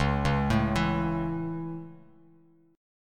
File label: Db Minor Sharp 5th Db Bass Standard; arpeggiated; Dbm#5; JGuitar